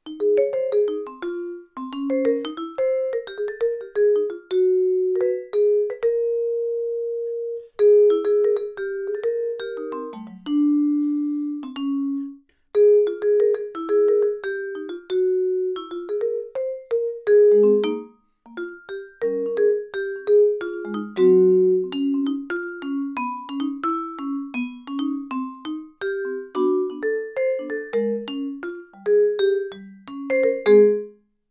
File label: chi chi, vibes